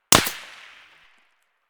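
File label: concrete distant; Escape from Tarkov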